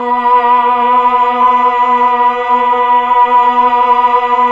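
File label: voice Mellotron choir, slow choir; Roland LCDP09 Keys of the 60s and 70s 1; Melotron Vox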